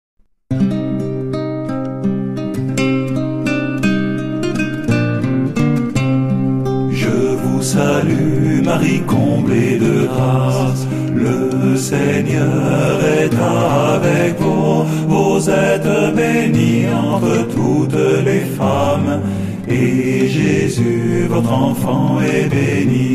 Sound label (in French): Je vous salue Marie Chant